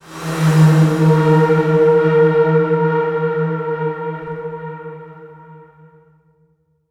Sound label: VFX Atmos